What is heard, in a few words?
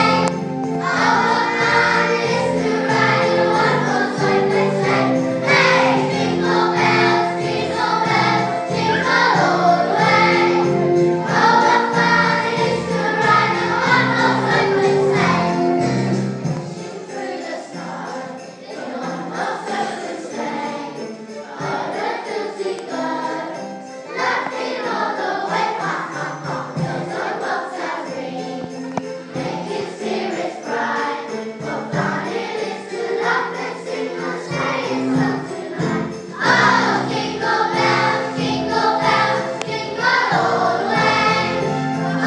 Christmas sing-a-long Jingle bells; Broadford Primary School